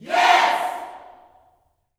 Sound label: "Yes" yell shout, gospel; Spectrasonics; Vocal Planet Vol.1 Gospel; akai